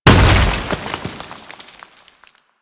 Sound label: Scan Pillar Plant Debris; Warframe; Warframes; Frumentarius; ScanAbility